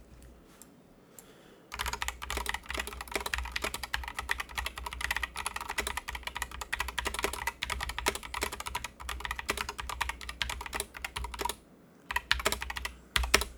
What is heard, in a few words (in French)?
son clavier corsair k70 rgb tkl opx